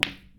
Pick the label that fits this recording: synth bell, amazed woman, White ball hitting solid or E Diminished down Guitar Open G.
White ball hitting solid